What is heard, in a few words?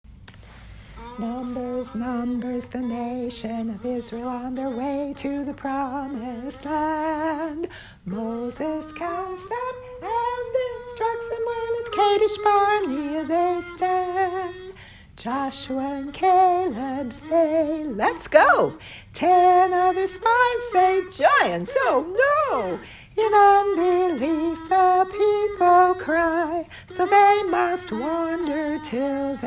kazoo; numbers